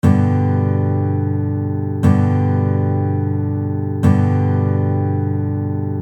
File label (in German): g dur, gitarrengriffe; 7 erste gitarrengriffe gitarren anfaenger